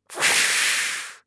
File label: attack, soundeffects; reddy